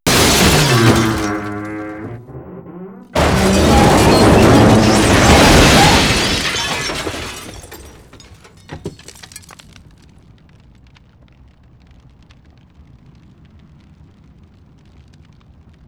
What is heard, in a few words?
Explosion Large Debris Fire